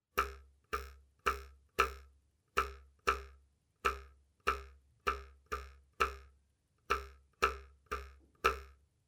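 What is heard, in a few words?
ruler sound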